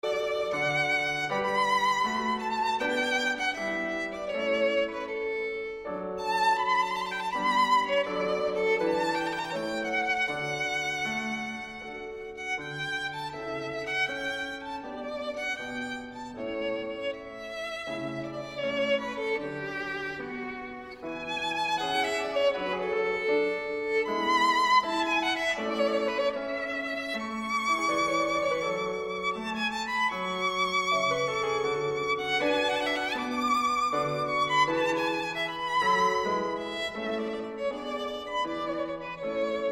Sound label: Violin Sonata in D Major, I. Affetuoso